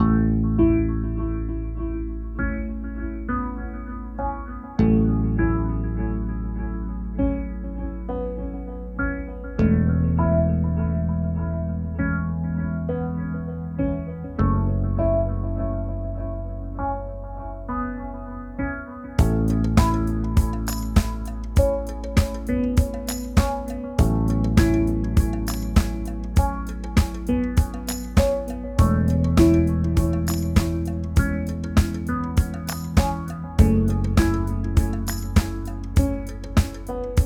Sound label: Midi